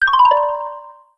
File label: Overfill warning; Audiosurf 2